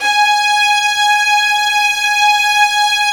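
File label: violin section, Arco Violins; E-MU Producer Series Vol. 2; More Studio Essentials; Composer; Arco Violins III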